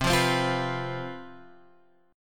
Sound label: C Major 7th Suspended 4th C down